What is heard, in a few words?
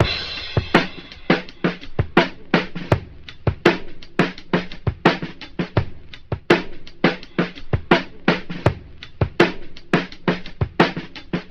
Drum loops, Boombap